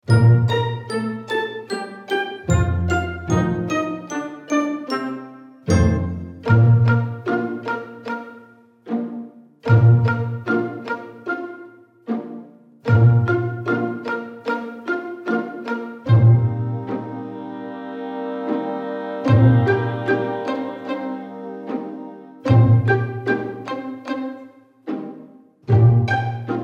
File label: Pretty BPM75 Bb